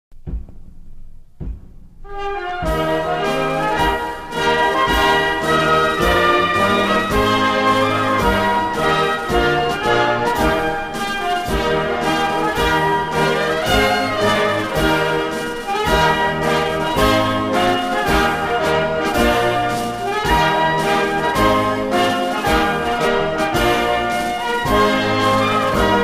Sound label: Regimental Band Grenadiers Slow March; Duke of Wellingtons; Colours Pass Through Ranks